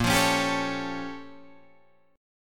A sharp Minor Major 13th, A sharp, down, Guitar, DADGAD